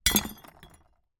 impacts broken wine glass smash more in cardboard box